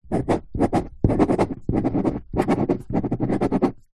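office pen ball point writing paper on plastic table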